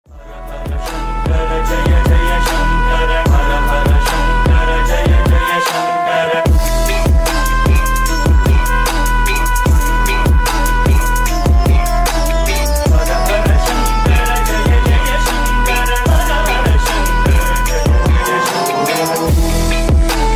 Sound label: shiva indian trap